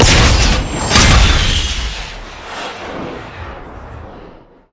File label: alter lock; LoL; altars